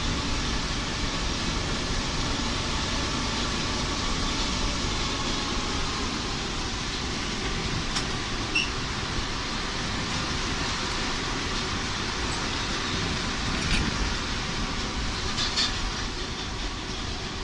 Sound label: slow, metro